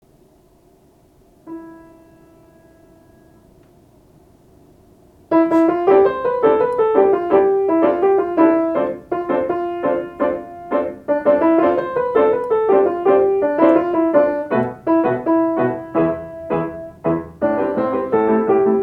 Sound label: melody